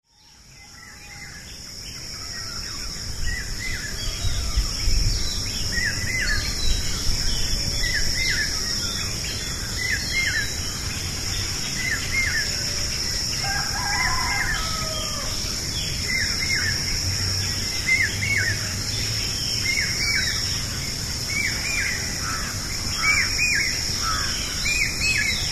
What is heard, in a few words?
soundscape dawn; italian